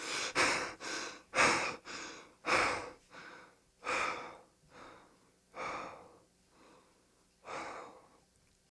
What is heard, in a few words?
player out of breath, police